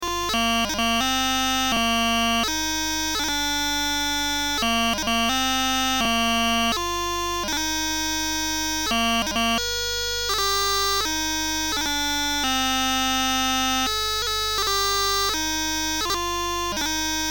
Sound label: happy birthday, Scotland